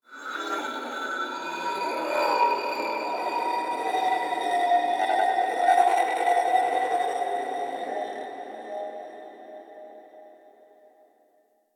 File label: Glass Texture Med, Ambience; Warframe; Cephalon Weave; DE